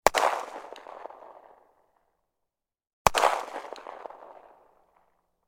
gun bersa; still north media

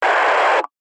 technology cb radio burst noise static

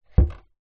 household laundry chute wooden lid close softly; zapsplat

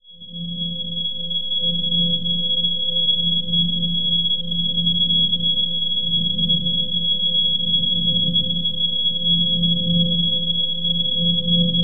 sound design drone pulsating high requency ring; fork media

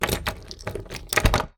household door keys lock; sound effects one

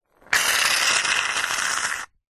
household shaving foam squirt

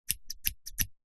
household manicure scissors snip

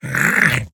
fantasy Goblin; little robot sound factory

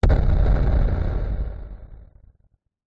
sound design explosion designed distortion

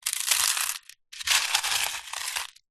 foley stones very small rummage movement in tin

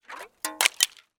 nature ice shard remove from water and break

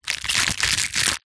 office sticky tape strip movement sticky; zapsplat

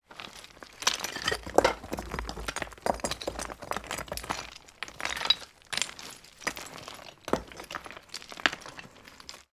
foley debris rummage; sound effects one

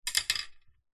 office pencil drop into ceramic sink basin

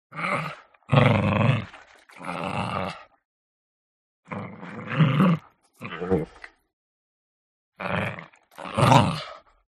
DOG Chihuahua Snarl Fight Soft; ANIMAL; smartsound

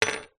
foley key mortice set down table

